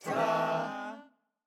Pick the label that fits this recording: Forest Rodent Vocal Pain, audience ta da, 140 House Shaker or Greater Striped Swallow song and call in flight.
audience ta da